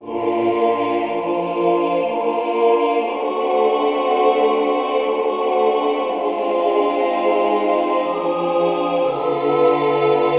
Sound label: All Parts, barbershop